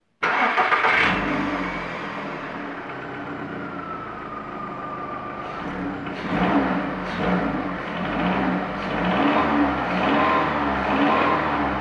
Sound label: VW Golf 5 GTI